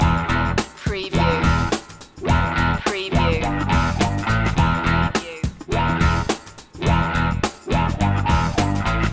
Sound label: through moving road loop